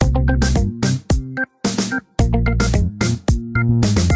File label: Organdub Motorola Original Ringtone